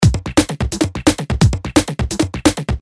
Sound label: Drum Loop